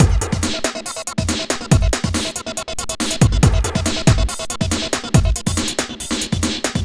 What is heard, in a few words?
Beat, Drums, Eclectic Electronica